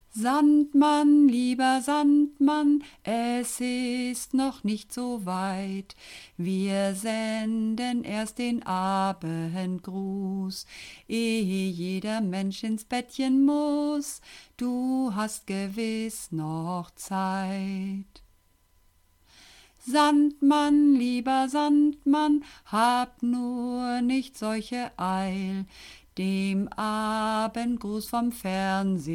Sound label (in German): Alt, Uebungsaufnahmen